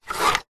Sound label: cash register till drawer plastic sprung note bill arm lever lift open; zapsplat